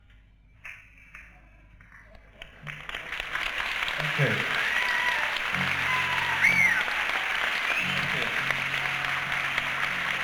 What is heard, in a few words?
Tuning; Lund, Sweden